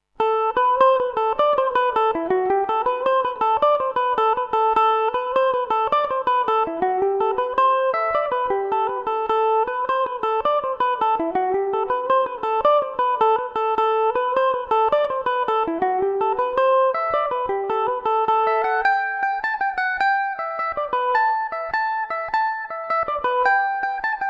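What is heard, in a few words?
jig A dorian revelation, irish mandolin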